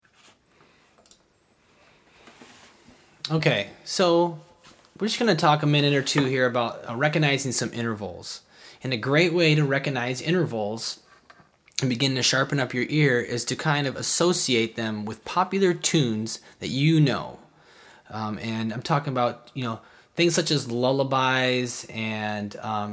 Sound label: Intervals; ear training tips for singing harmony